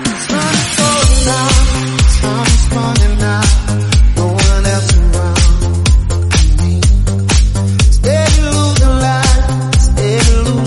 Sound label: Disco 124bpm Cm